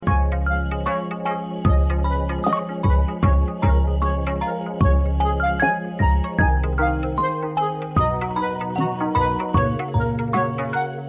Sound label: Menu Music; Crowdy Farm Rush